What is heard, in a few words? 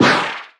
dazed